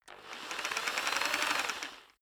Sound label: rock drill hammer rev long soft; maschinen; bohrer; soundpool